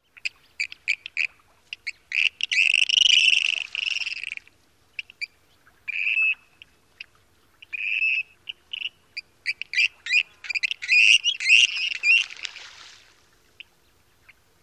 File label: rattling calls